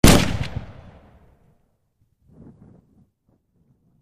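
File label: WW1 Mauser Anti Tank Rifle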